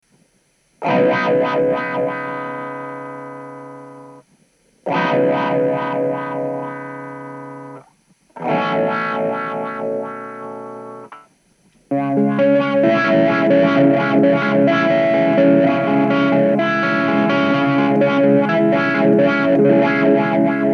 buffer wah

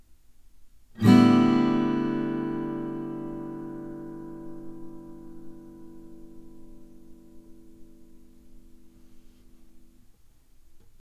TUSQ after

